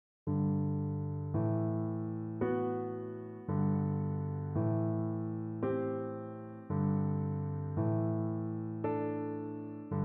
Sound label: piano